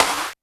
CLAPS HIP; Best Service Dance Mega Drums

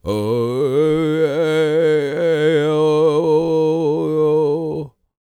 World Voices, CHOCTAW

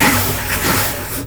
TABLA POWDER; Spectrasonics; Heart of Asia; INDIAN SET

